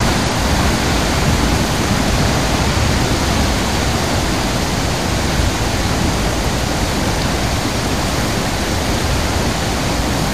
Waterfall From Aponguao, Venezuela, Medium Distance